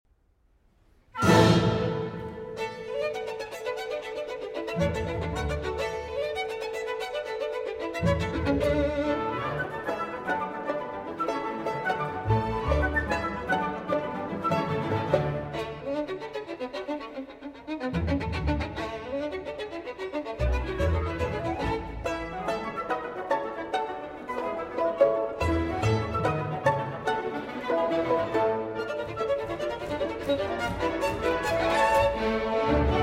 Concerto for Violin and Orchestra in D Major, Finale Allegro assai Vivace; Op.35 III.